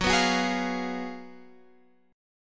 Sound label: Gb Major 7th Suspended 2nd Suspended 4th, Guitar Open F